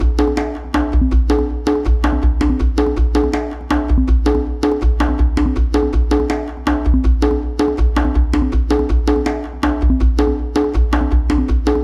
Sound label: drums; lucah born of a dream